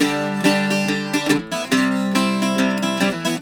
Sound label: Celtic Flavours; USB Soundscan vol.42; AKAI